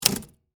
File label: Typewriter Keystroke, Technology, Typewriter, Keystroke, Single; Typing; Offices